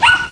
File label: puppy barking; pets; Sound Effects for Multimedia - Volume 1